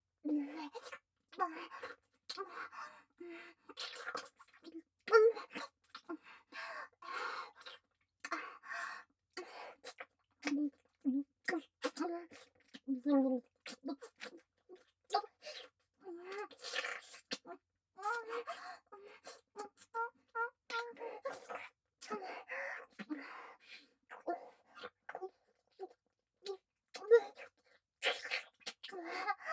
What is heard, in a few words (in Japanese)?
フェラ音 強め